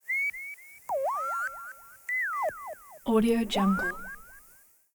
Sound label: electronic beeps alien birds chirps; prosoundfx